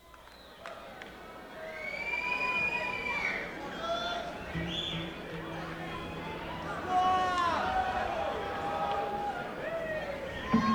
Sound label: Tuning; Keene, NH; The Colonial Theatre